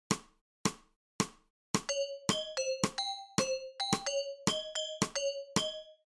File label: scale